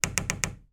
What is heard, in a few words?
household door wooden internal old creak gentle